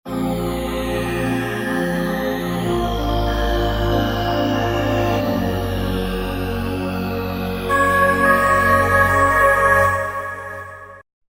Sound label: Roland V-Synth; PG Surprise; Factory Patches